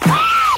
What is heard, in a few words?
scream